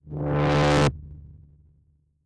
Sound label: Drone